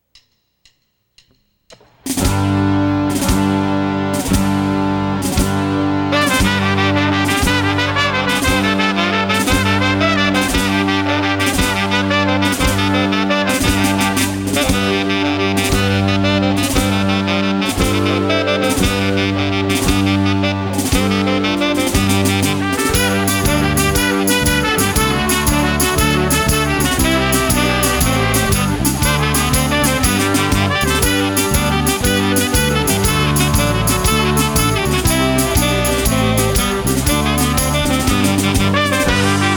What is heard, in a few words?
Irish Jig